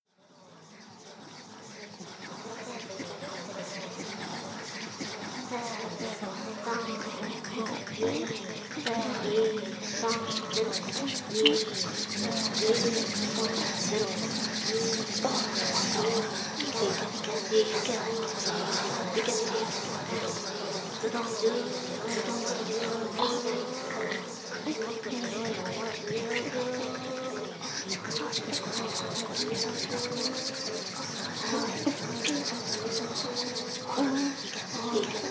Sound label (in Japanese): フェラ＋しこしこ＋カウント, ASMR; ダウナー風俗オナサポ味